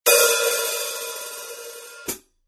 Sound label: 14in Quick Beat HiHats, cymbal sound